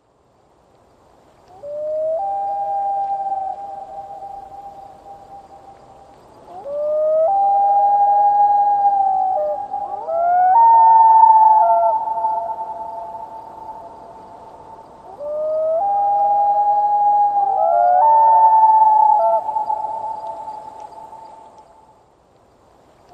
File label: Voices Common Loon